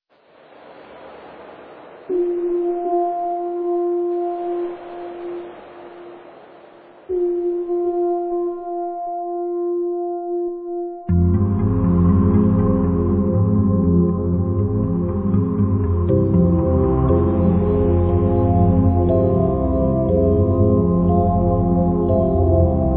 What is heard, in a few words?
f min Rollingicus Waveicus; chromaticus; c3